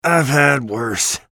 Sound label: pain ability damage; bj blazkowicz; low health